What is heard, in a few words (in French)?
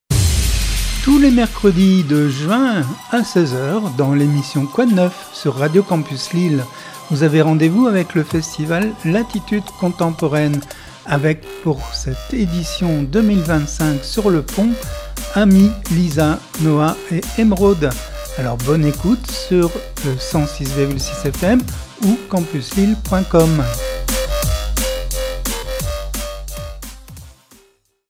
jingle; Latitudes Contemporaines 2025